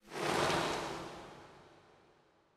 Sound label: Dragging Body